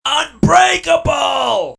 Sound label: Multi Kill